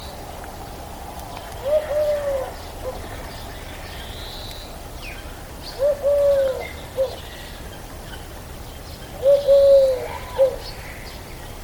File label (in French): tourterelle turque chant